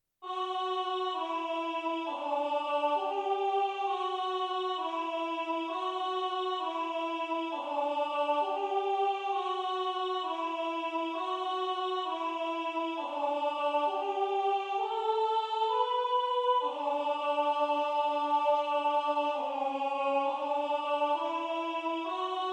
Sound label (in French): conquete de Paradis B alto; Chants